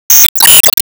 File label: Ball Return; disney star smash android ios mobile gamerip 2020